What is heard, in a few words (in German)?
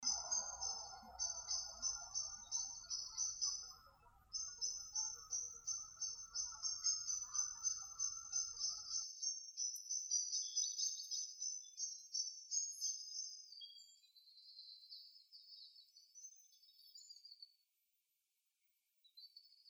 Lichtung Soundaufnahme Botanischer Garten Marburg